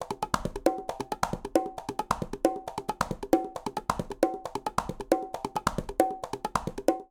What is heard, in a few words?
Rhumba Quinta 135bpm; Latin Percussion; Ableton; Factory Packs